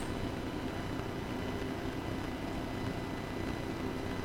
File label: mic error